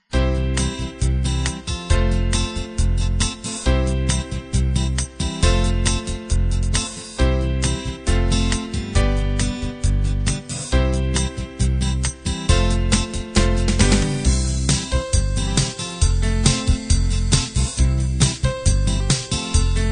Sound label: bubble gum